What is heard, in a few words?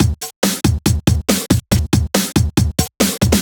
Break Beats, 140 BPM